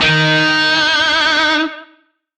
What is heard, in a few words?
Shawzin D Sharp; Oct Two; Music; DE; Warframe; Lizzie Shawzin